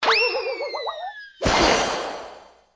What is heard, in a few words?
whistle up and down cartoon